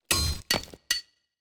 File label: environment successful build stinger